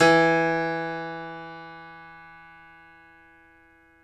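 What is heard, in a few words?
piano, Grand piano; Club-50 - Foundations Roland; YPno 1 S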